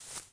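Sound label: grass walk, footsteps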